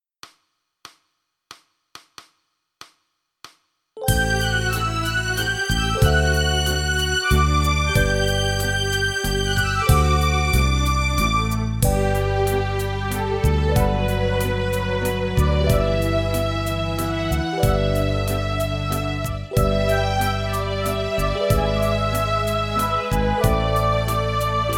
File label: Party Song Waltz Instrumental G; backing track